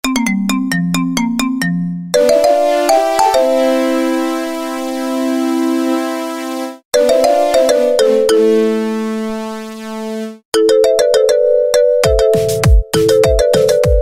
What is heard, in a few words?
marimba bgm iphone ringtone; thalapathy vijay